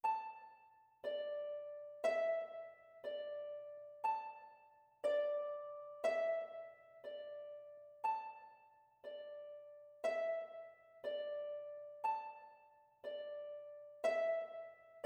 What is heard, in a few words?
harp